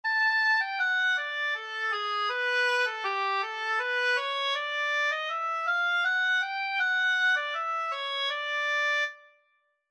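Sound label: Intervals light chromatic oboe; 7b Intervals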